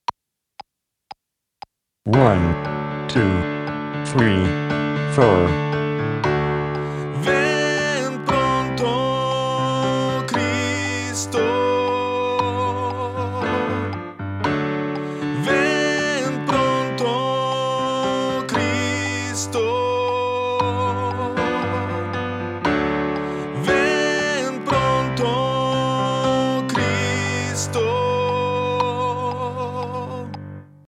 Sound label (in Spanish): GUIA TENOR